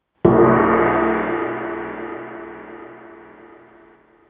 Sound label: Gong Message